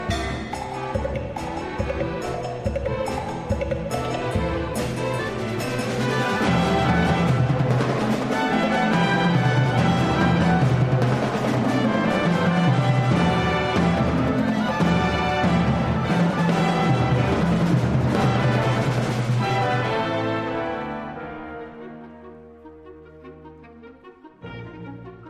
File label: concerto for solo percussion and orchestra